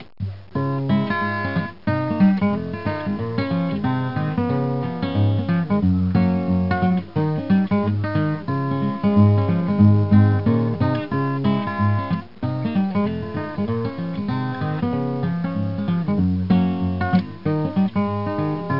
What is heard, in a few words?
GUITAR